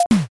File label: toaster